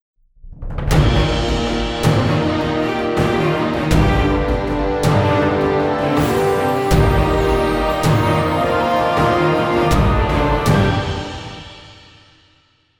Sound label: aventure jingle